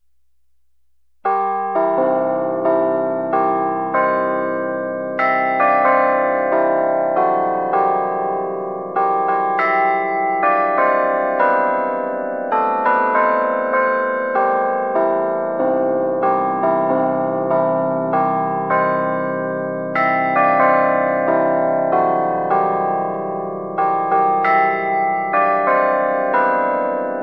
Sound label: star spangled banner Bells; Verdin Bells